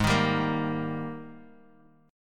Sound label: Ab Major 7th Suspended 2nd Ab down Guitar Half Step Down